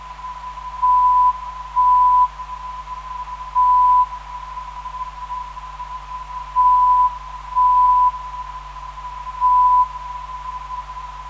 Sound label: MT 385kHz